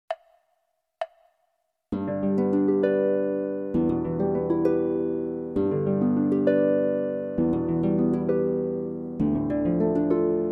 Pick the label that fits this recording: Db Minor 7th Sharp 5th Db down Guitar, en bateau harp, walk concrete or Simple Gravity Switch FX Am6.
en bateau harp